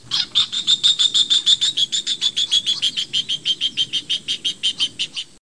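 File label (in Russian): amerikanskaya yakana jacana spinosa, northern jacana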